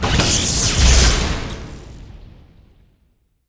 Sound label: cannon death, monster